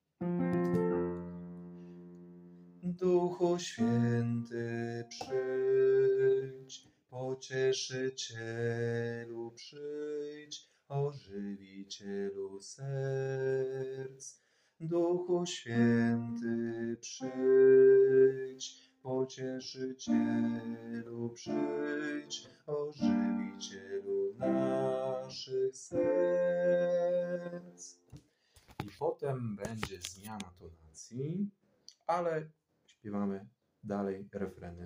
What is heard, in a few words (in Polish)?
bass; Duchu S╠uwie╠Ety przyjdz╠u; nagrania glosow